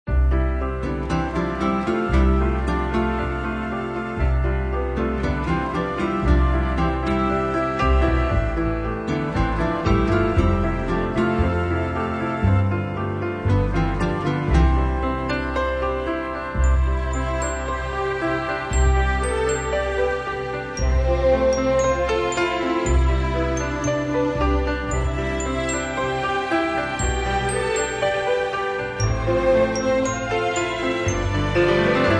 Orchestra, Strings

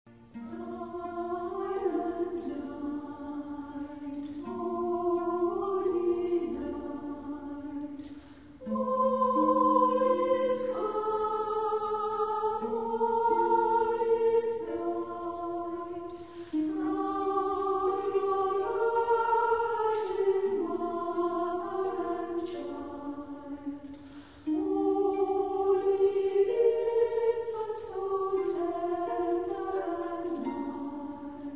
Orchestra and Choir

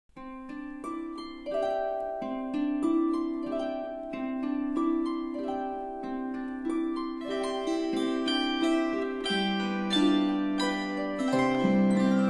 silent night, christmas carols on the harp

